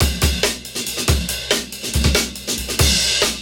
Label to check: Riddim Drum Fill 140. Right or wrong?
Right